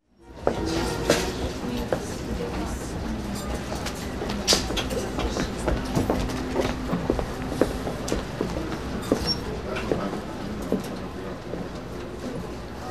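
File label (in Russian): магазин на людной улице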